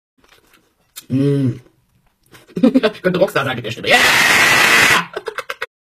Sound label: yeeeeeaaaahhhhhh